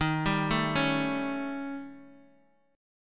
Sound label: D sharp 7th Flat 5th, Guitar, Open D